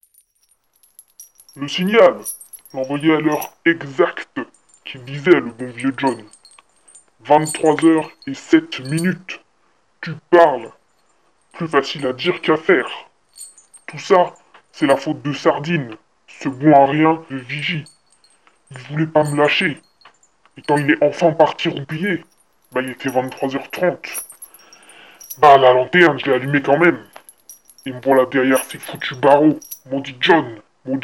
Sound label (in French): signal lumineux